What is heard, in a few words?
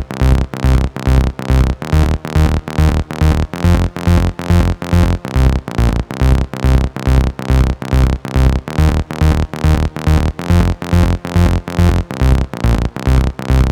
140BPM C; Vengeance - Essential Clubsounds Vol.4